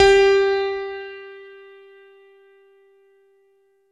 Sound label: JV E GRAND, JV-1080, PIANO; Syntec; Wall of Sounds